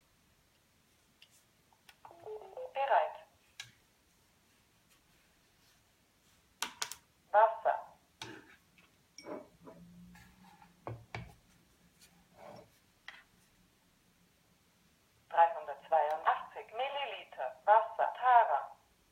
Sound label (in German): sprechende Waage mit Wasser